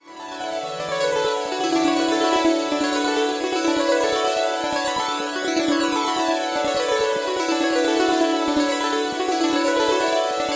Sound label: Use For Pads; 1010music; fxbox